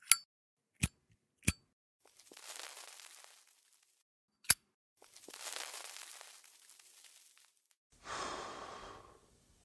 smoking lighter; Zomboid; Smoking Sound Overhaul

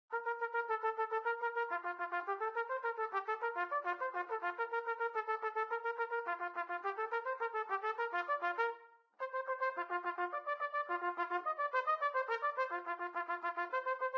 Trumpet Training; Instruments

